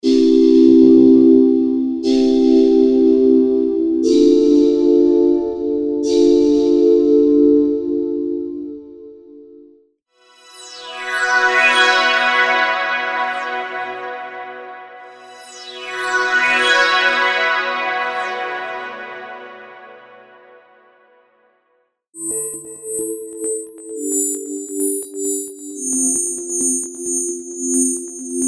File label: Pad Audio